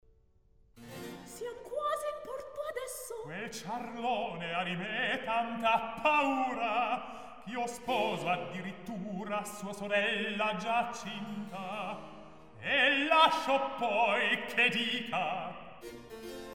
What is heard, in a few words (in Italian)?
Recitativo; Siam quasi in porto adesso; Mozart; La Finta Semplice